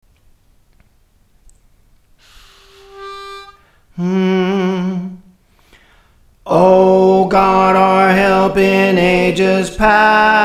Chant Soprano, Songs; Praise For The Lord Training; O God Our Help in Ages Past; Harveys Chant

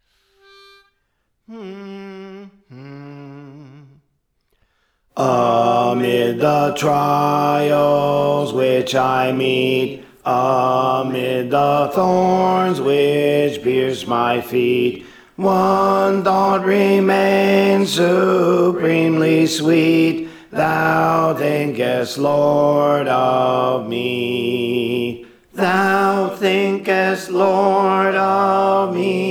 Alto; Thou Thinkest Lord of Me; Praise For The Lord Training

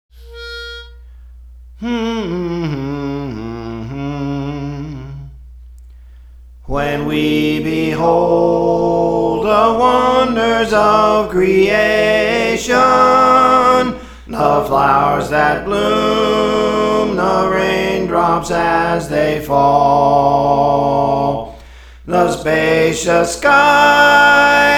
Soprano; Praise For The Lord Training; Lord I Believe

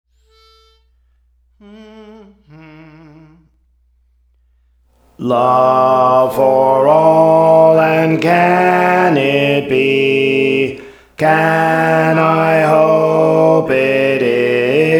Alto, Songs; Praise For The Lord Training

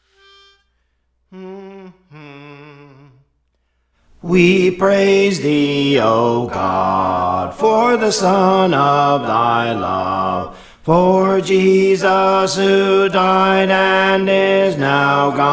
Bass; Praise For The Lord Training

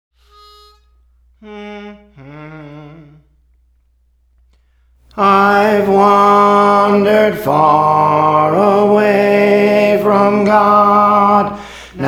Tenor; Praise For The Lord Training; Lord I'm Coming Home

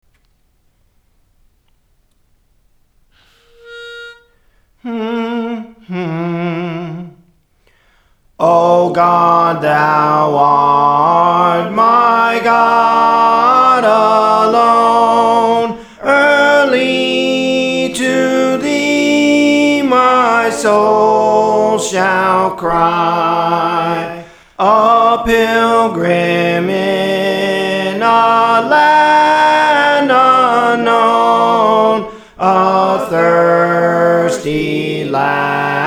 Soprano, Songs; Praise For The Lord Training

